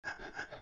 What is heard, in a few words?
panting; English Prep Package; RexDoesTricks